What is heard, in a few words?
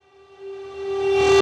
Tonal Reverse - G; House; FX; Vibrate Tonal Reverse